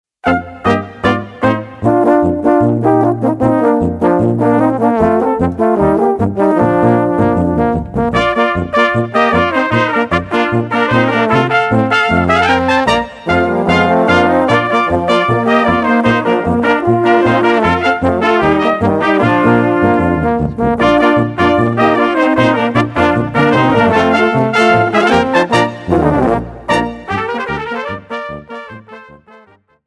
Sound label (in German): Polka